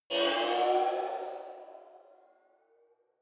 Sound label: sci-fi vehicle turbo activate; raumschiff